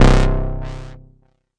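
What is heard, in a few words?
mbrute synth, Arturia MiniBrute; DRUM MACHINES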